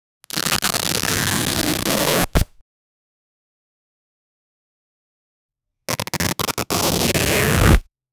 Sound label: fabric tearing; cloth